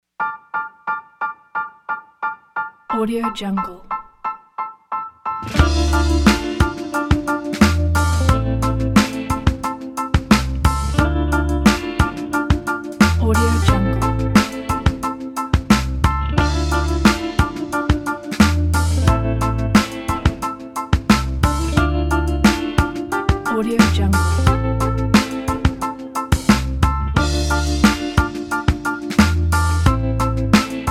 neo soul groove; csrproductions

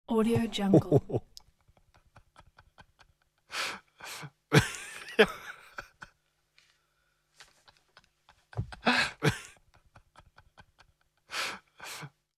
man chuckling and laughing

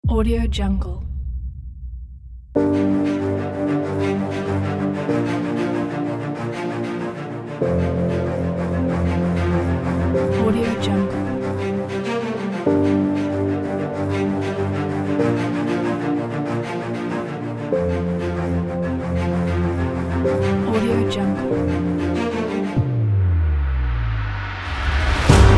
inspiration cinematic kit

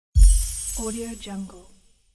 happy new year impact

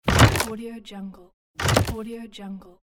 opening chest; gamechestaudio

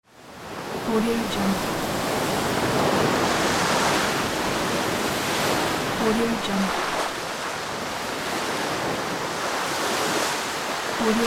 sea waves

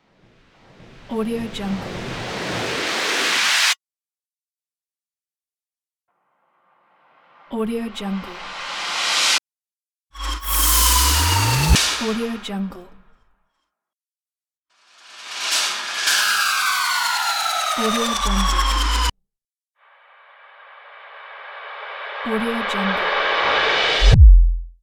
epic rises; unicorn sound

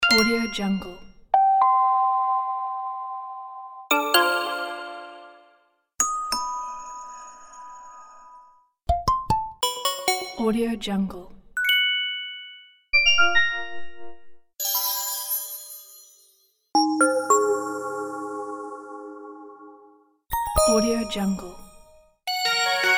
interface sound pack

